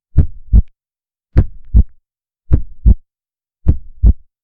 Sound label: organic squelch intense, creature